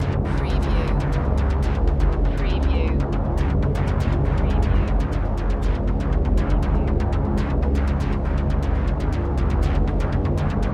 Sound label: Drone Sweeper Rhythm; Sound Effects Bible